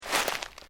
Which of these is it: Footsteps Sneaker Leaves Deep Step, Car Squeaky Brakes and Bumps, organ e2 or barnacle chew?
Footsteps Sneaker Leaves Deep Step